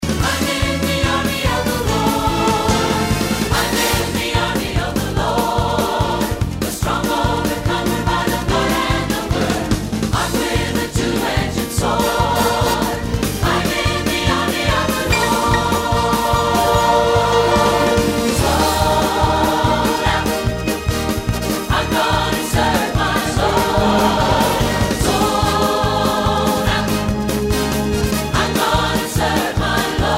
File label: Melody